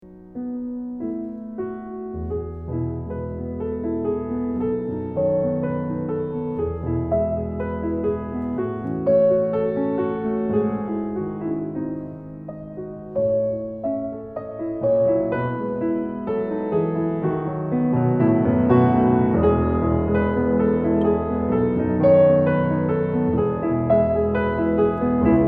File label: Piano Sonata